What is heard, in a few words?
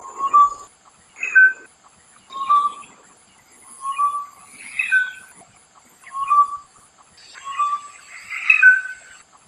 Black Magpie calls Ulu Kinta Forest Reserve Perak Malaysia